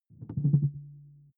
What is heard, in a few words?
sci-fi alien tech dull; swooshes-swishes